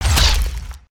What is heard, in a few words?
Fish Crossbow Fire; weapons; aronwk collection; ndaudio